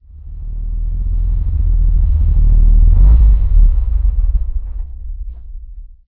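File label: valentine engine interior stop; cannons; War Thunder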